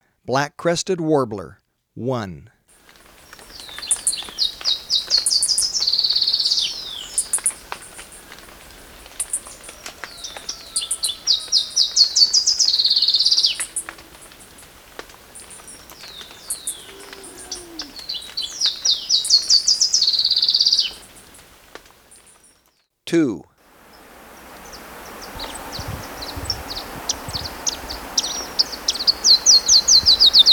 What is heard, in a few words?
Black-crested Warbler, Birds of Ecuador; S. America